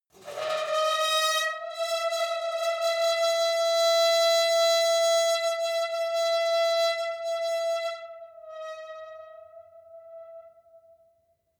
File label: metal squeal saturated long